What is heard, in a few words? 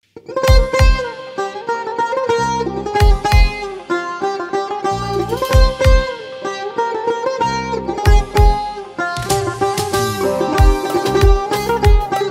Instrumental Version With Tar